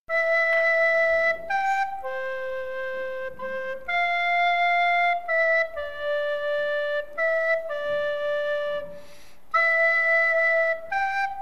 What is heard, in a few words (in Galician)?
vento melancolico